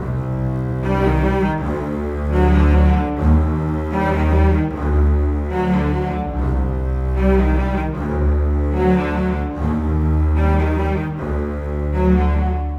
Bass Cello, Cinematic

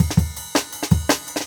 Jungle Breaks

